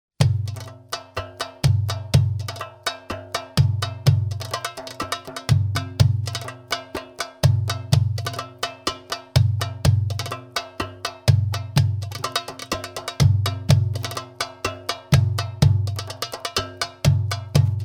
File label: Rumba, Drums for Training